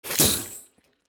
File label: Spiters